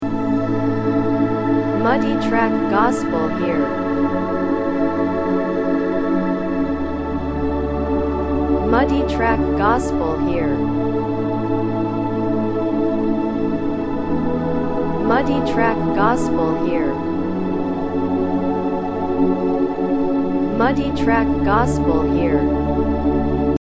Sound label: keys pad